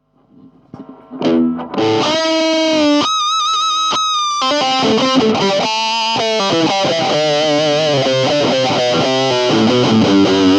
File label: solo hi gain; Cort Zenox Z-42FT; Digitech Grunge